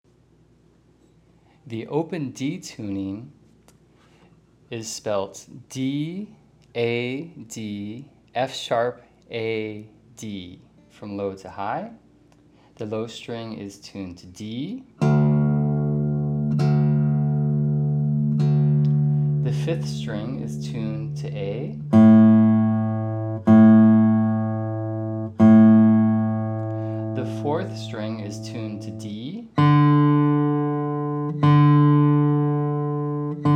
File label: Open d, guitar